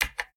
button pressed; guodongrenwu

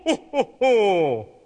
hoho

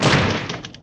boom

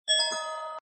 Star Up; blockgame

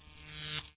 electro dead; game; monster; monstersunion

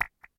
remove object; soccermove

